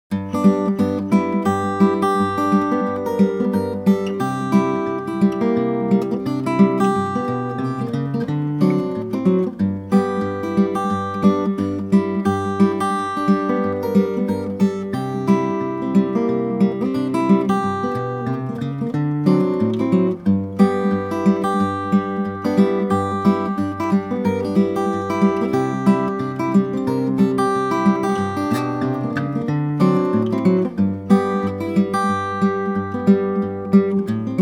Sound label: Nine Pound Hammer solo